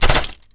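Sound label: droid large fall Dirt; droids robots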